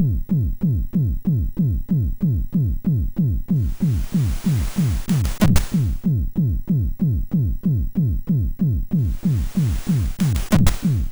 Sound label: Heavy sid MOS6581; hvsc